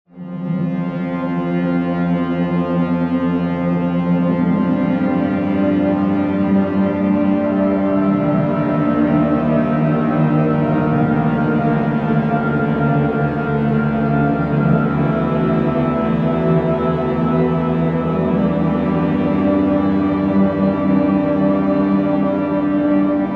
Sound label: tension